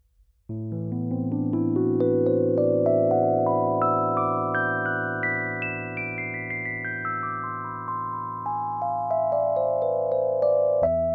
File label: rhodes no EQ